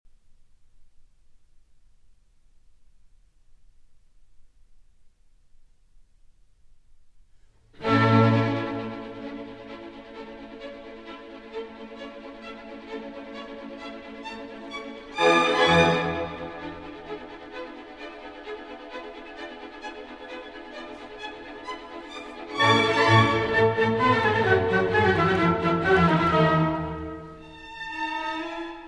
Violin Concerto No. 5 in A Major; K. 219; Mozart

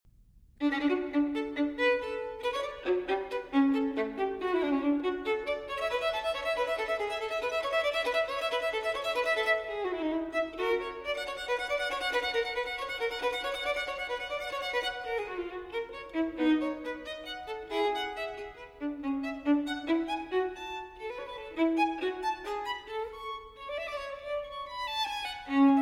Solo Violin in E-Flat Major Allegro; TWV 4020; Telemann Fantasia

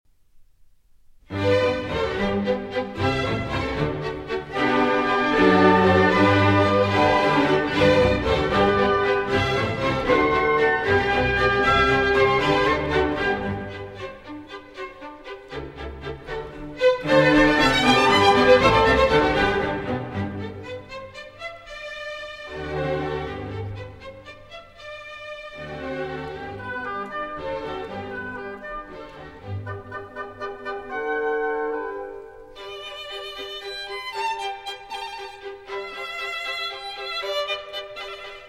Violin Concerto No. 3 in G Major; K. 216; Mozart

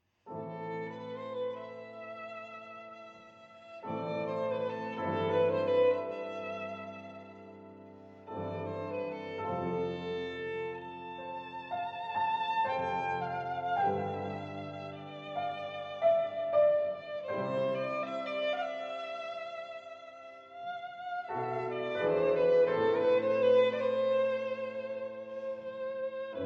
Melody in A Minor

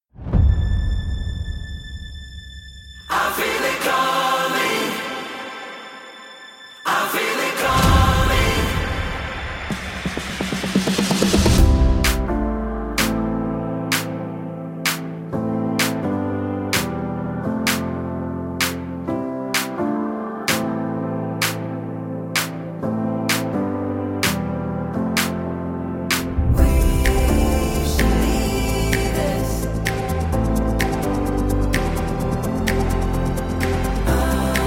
Karaoke Version

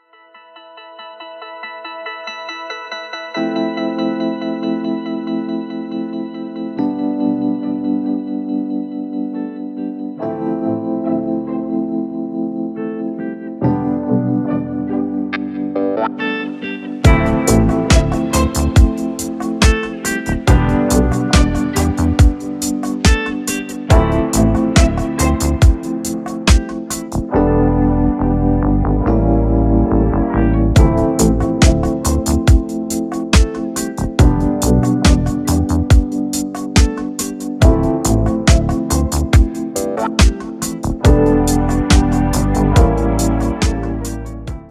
Instrumental

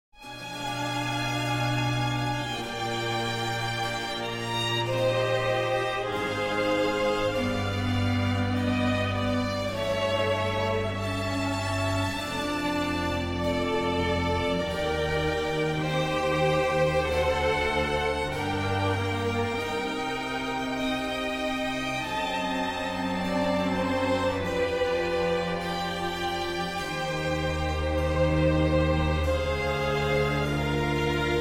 Concerto for Strings and Continuo in F major

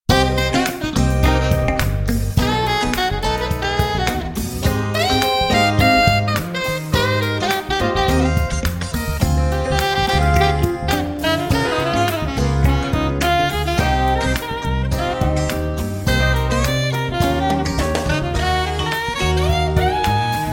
Funk Jazz

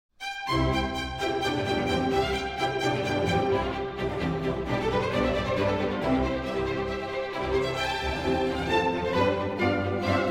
Sinfonia in C Major Allegro

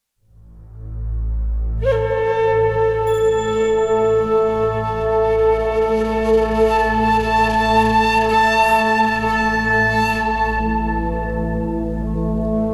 Middle Eastern Flutes Ambient

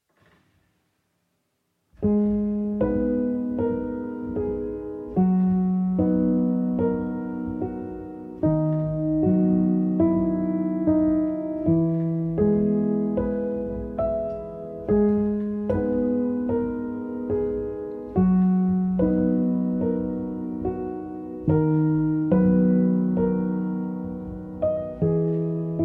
Solo Piano

